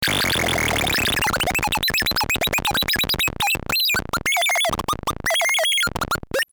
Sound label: bleep tone